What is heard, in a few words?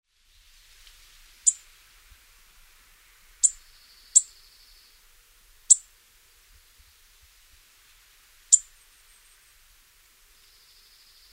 nashville warbler